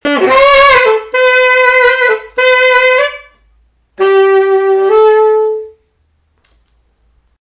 sexy music; scrubs

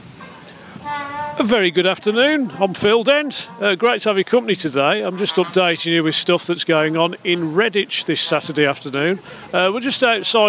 jazz at the bandstand; church green redditch

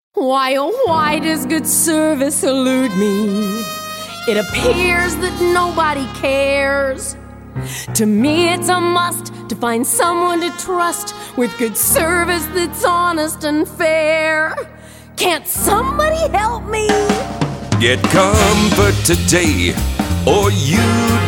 jingle

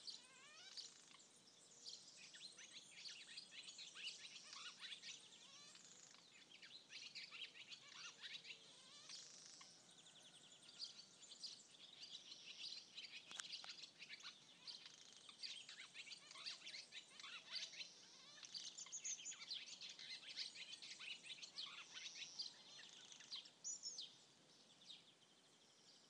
all natural alarm clock

